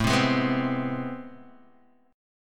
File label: A Minor Major 9th, down, Guitar, Open D